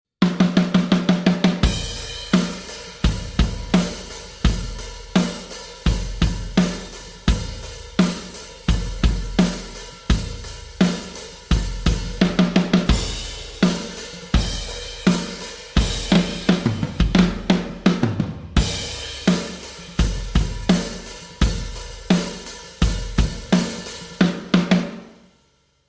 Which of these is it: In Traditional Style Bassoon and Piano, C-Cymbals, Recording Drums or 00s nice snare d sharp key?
Recording Drums